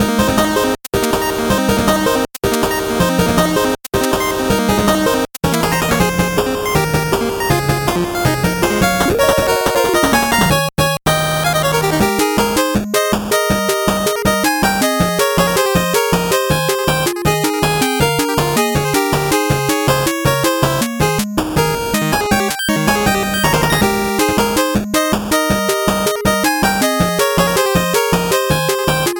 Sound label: 8bit bgm normal